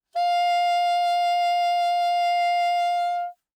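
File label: Soprano Saxophone vibrato ff F5; Woodwinds; Bb Soprano Saxophone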